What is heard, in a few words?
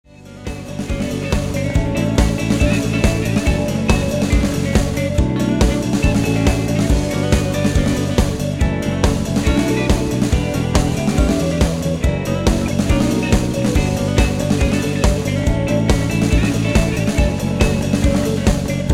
EG Cutting Mixed